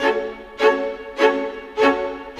Viola, Violins, Orchestral, Rock Pop; Sonic Foundry (Sony Creative Software); Orchestral Series VOL-4 - Rock and Pop

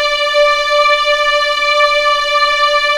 D5 LEG F, VIOLINS; East West; The Ultimate String Collection

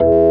KEY, Organs; Club-50 - Foundations Roland

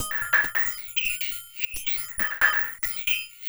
SAD SWEEP R; Spectrasonics; Metamorphosis

